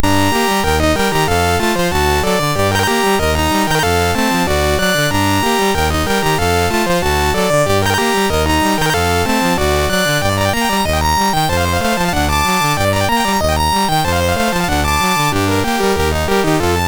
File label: sid MOS6581R3